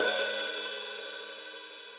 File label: Hihat Open; Drum Hits; Standard Hits; Cop Style; Ableton; Factory Packs; Session Drums Studio